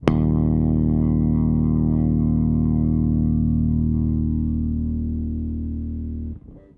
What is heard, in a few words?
OCTIVER BASS; Sampleheads; Ultra Freakin' Bass